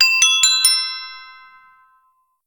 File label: level up; Minetest; VoxeLibre